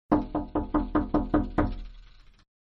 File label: Knocking On Window, Inside; Miscellaneous Doors